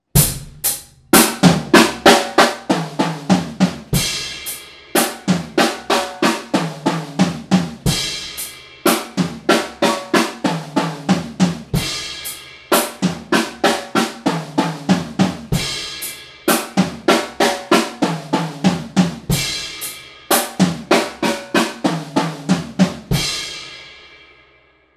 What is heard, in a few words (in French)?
break triolet long; passe partout